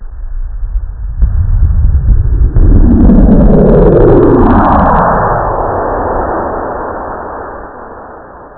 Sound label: ufo fly